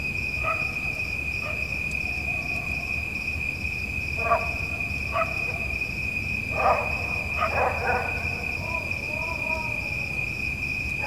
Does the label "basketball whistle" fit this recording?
No